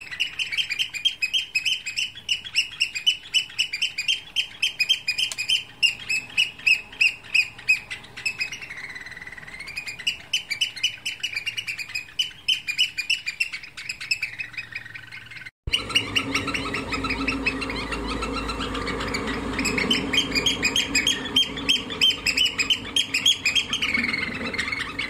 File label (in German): austernfischer